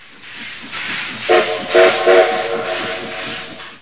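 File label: steam engine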